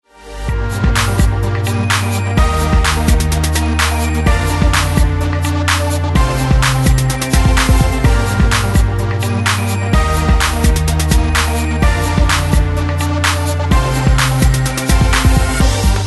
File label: karaoke; disney